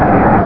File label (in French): Cri